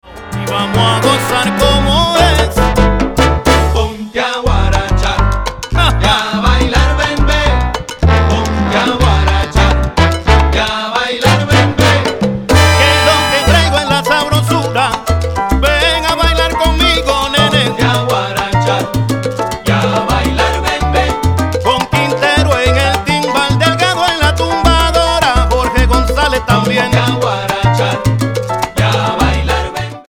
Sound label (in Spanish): Guaracha y Bembe